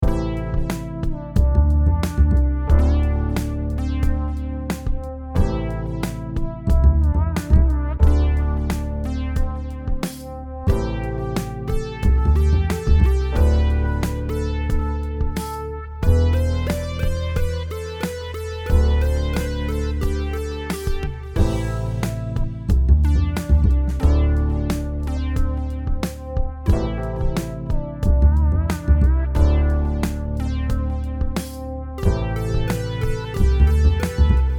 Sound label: song